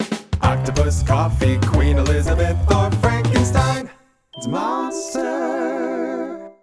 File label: Bumper; YDKJ; monster; The Jackbox Party Pack 5; Jackbox Games